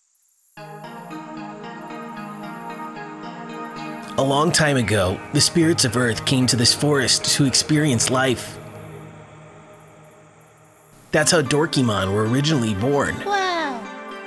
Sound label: patch from scratch on the super6; leads to nonsense; weeklybeats 2026